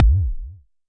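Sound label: kick, Jomox MBase 01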